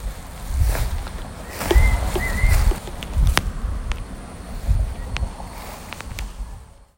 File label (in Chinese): 仙八色鶇, bird watching